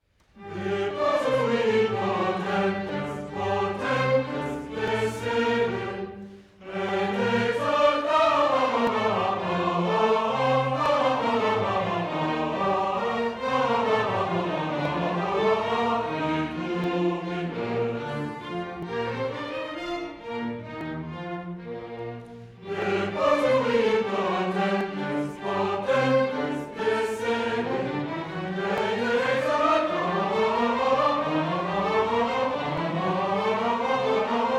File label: chorale; magnificat vivaldi